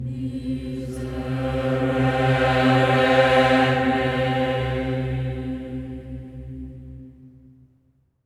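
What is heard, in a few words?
OCT MISERERE, Voices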